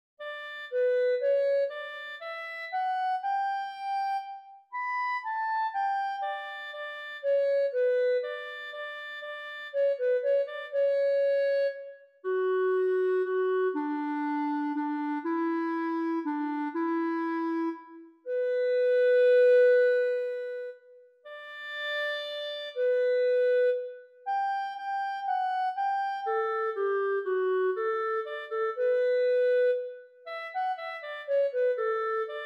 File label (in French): CLARINETTE